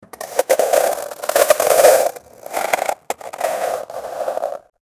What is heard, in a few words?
sound effect, ice